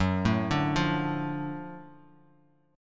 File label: Gb Minor Major 7th Sharp 5th Gb down Bass Standard; GbmM7#5 arpeggiated; JGuitar